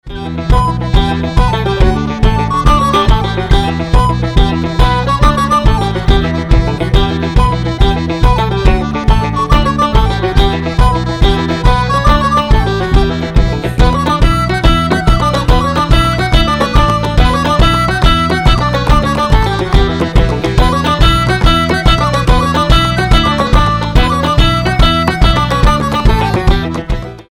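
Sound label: Irish polka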